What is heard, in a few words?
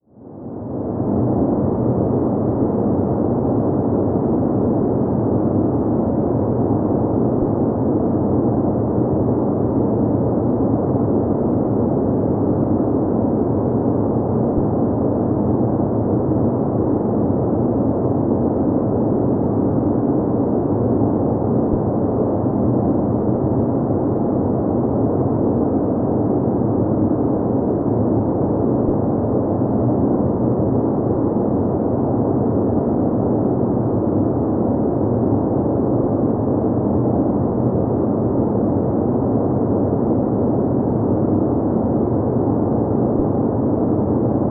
Panorama Noise